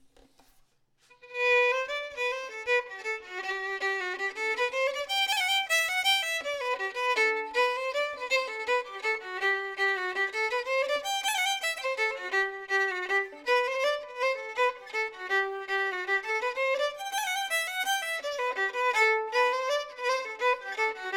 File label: Hornpipe, Normal; Wexford